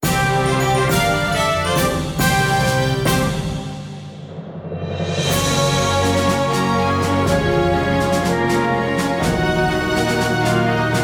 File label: Jingle Win; Taiko no Tatsujin V Version; PS Vita